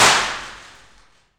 Aluminum Wall, FX Perc; Roland L-CD701

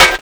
SD, Drum & Bass, 160 BPM

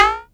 SYNCUSSION

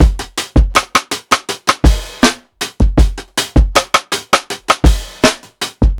PICCOLO X STICK, breaks, drums; Basement drums vol 2

